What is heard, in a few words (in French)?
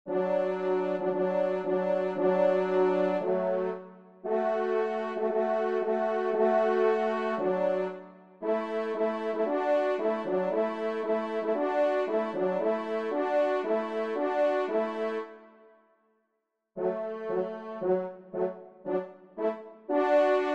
Quatuor; 2e Trompe; Dauverne; Quatuor N1